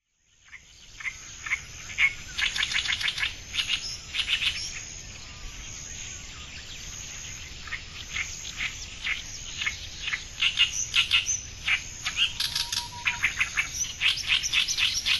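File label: Oriental Reed Warbler; watarase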